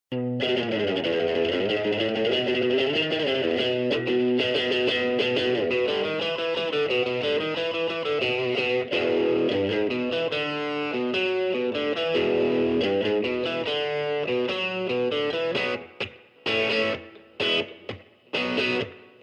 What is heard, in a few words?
Guitar Riffs